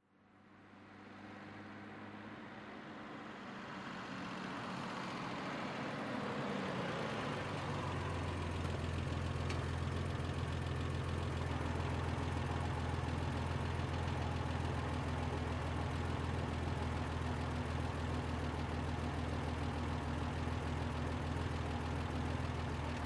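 Bmw 530i, In Medium Speed, Stop close up, Idle, Away Medium Speed.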